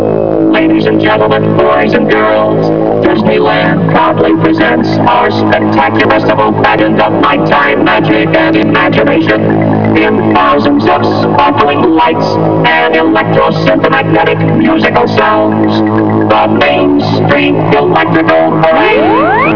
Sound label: intro; recordings from Disney theme parks and movies